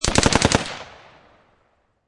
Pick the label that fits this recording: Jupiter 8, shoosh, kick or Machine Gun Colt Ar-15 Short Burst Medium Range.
Machine Gun Colt Ar-15 Short Burst Medium Range